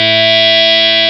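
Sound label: feedback A1, El. Guitars; 4 El. Guitars; E-MU Producer Series Vol. 2; More Studio Essentials; Composer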